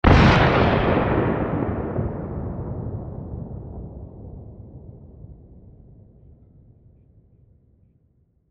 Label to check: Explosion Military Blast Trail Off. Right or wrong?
Right